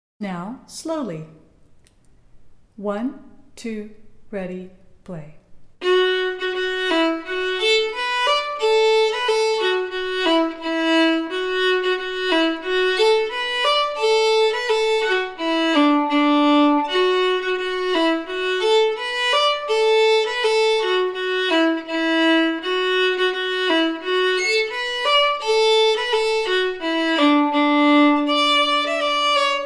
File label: slow